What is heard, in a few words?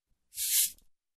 Cabasa Long